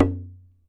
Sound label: darabuka MUTE; AKAI S6000 CD-ROM; WorldSounds; DAF+DARABUKA